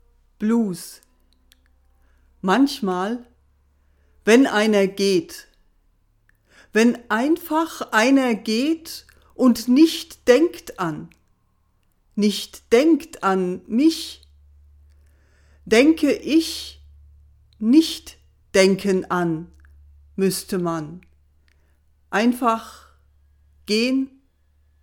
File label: Blues